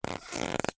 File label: cartoon squeeze squeak